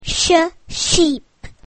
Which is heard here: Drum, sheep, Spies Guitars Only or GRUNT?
sheep